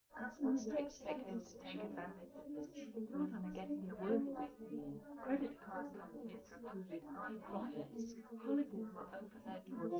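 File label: female, live recording 250ms 5cm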